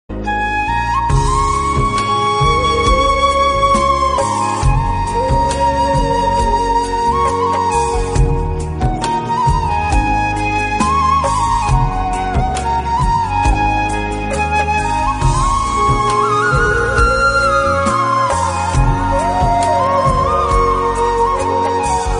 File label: flute; hindi